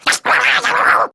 Attack Effort; Grineer Jester; Warframe; Battle Barks

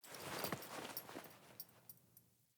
Foley Actions Default Wolf Jog Start

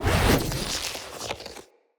Casting Device Projectile Whizz By Heavy; Fenn

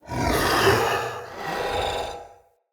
Male Vocal Breath Light, Enemy; Mockery; Mockeries

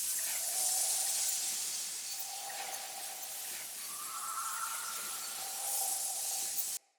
Rage Active Loop; Fey; Founders Champion

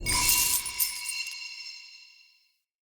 UI Vendor Sell Confirm

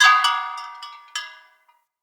Bell Swing Long; Enemy; Corrupted Sproutfolk; Foley

